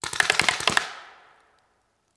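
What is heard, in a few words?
Paintball Gun Impact; Sound Ideas; Guns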